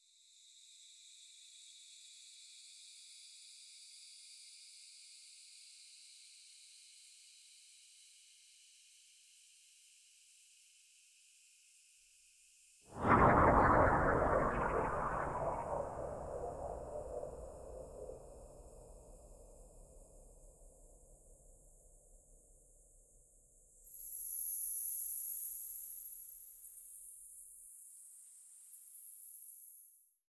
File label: crunch strata